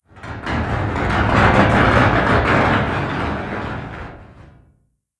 random metal rattle; Left 4 Dead 2

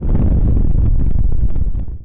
Carrier Hurt; Sid Meier's Civilization 4 Complete; Beyond the Sword; Final Frontier; Units

